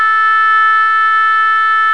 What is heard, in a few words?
oboe la